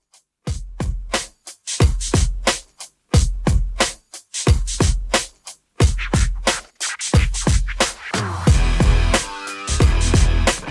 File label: Redrum, 90 bpm